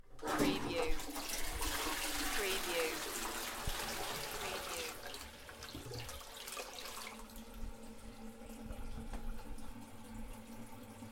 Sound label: Toilet Flush; beanstalk audio